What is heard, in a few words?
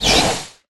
Swish